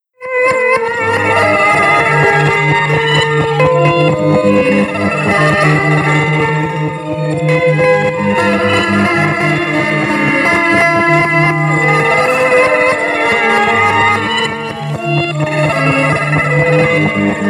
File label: crickets